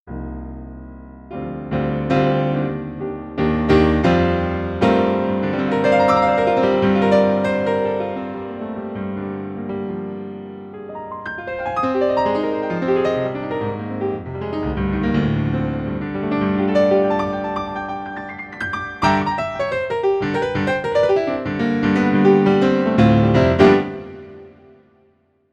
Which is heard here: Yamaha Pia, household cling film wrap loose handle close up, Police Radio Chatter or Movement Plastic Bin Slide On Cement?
Yamaha Pia